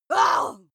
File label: witch scream groan short